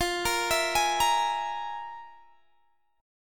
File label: F Minor Major 7th Double Flat 5th, Guitar, Open G